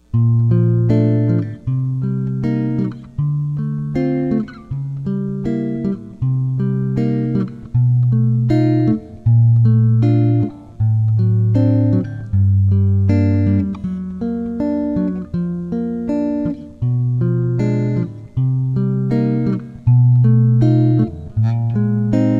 fingerstyle comp

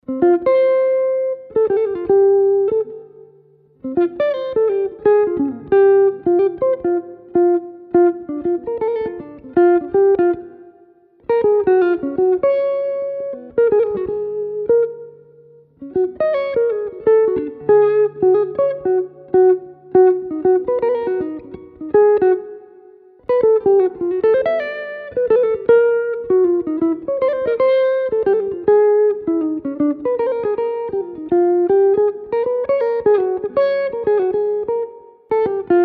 melody; jazz tunes

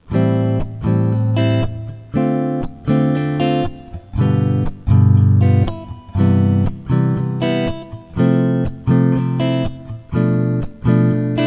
Open Chords, jazz tunes

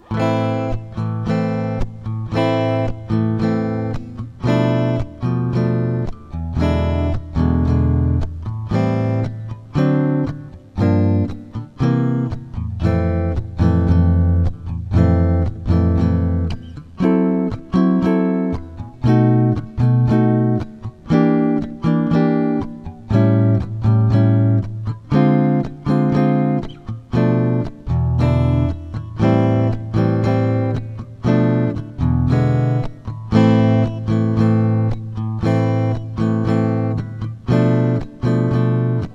simpler comp; jazz tunes

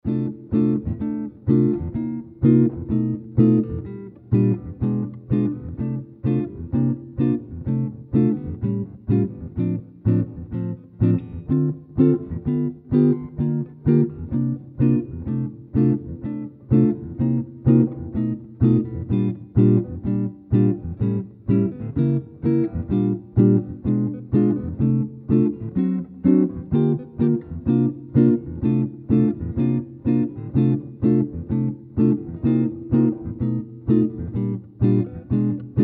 The Preacher Harder Comp, jazz tunes; instructional materials

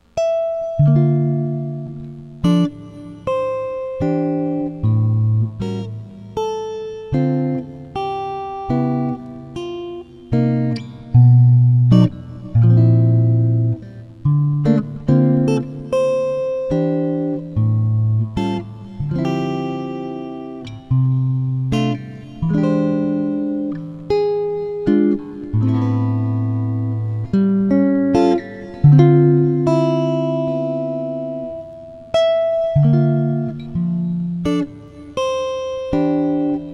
Chord Melody; jazz tunes

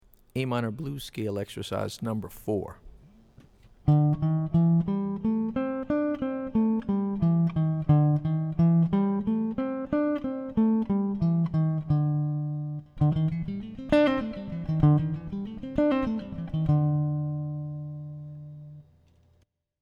A Minor Blues Scale; lead skills materials

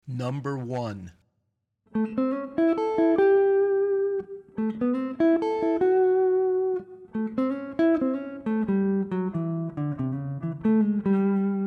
Blues Jazz Solo Concepts; lead skills materials

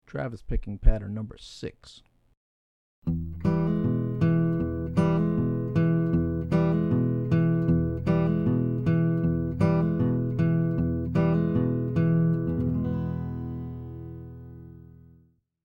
Travis Patterns; travis style lesson materials

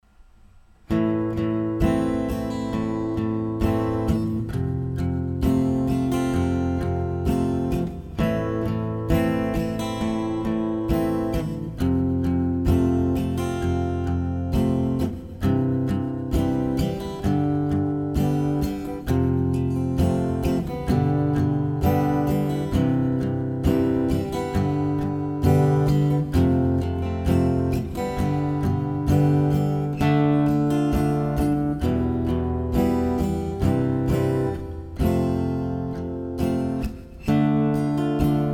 strum style tunes

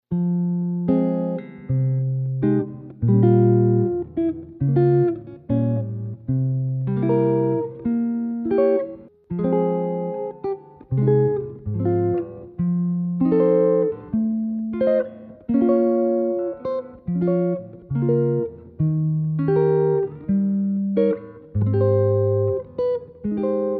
Chord Melody; jazz tunes

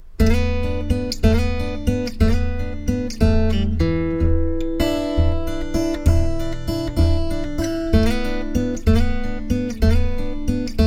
chorus, dead thumb fingerstyle

